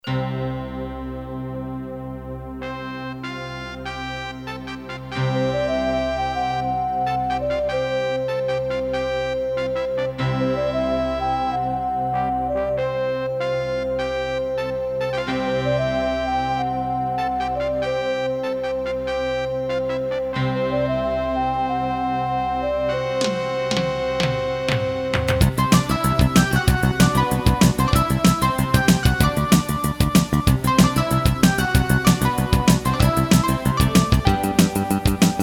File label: Casio GZ-50M; Space Quest 3 Intro